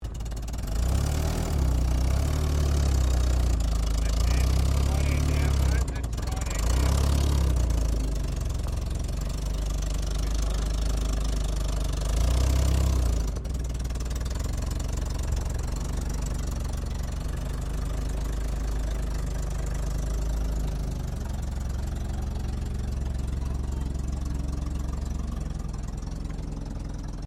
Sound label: Armored Car Daimler Ferret Manouver Slow Talking, British Ferret Scout Car; Truck Sound Effects; Trucks (Sound Effects:Vehicles)